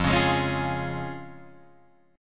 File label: F Minor Sharp 5th, Guitar Open G, chord